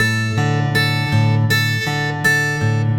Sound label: Acoust, Guitar